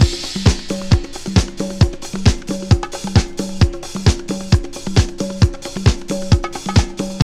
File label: house loop